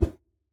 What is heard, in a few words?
woosh